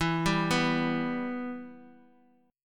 E-Minor; Em arpeggiated; JGuitar

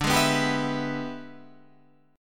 E 7th Sharp 9th D, chord

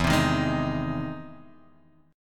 E Augmented Major 7th E, E+M7 chord; strummed; JGuitar

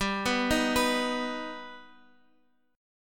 G Augmented G Guitar Half Step Down

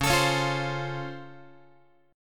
C sharp Augmented Major 7th down Guitar, chord; strummed; JGuitar

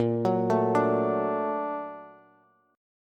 Bb Augmented Major 7th Bb, Bass; Bb+M7 arpeggiated; JGuitar

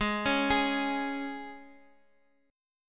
C sharp 5th, G sharp, guitar chord

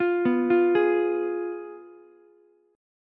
F Diminished Ukulele; Fdim arpeggiated; JGuitar

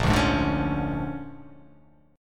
Bb 7th Sharp 9th, Bb7#9 chord; strummed; JGuitar

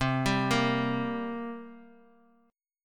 G Minor Double Flat 5th, C

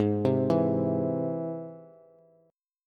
Ab Augmented Ab, Bass; Ab+ arpeggiated; JGuitar

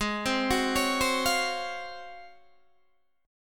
Ab 13th down Guitar DADGAD; Ab13 arpeggiated

